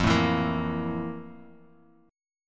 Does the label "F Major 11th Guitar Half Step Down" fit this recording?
Yes